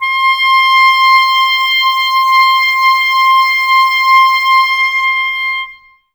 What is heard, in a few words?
Clarinets, Wood Winds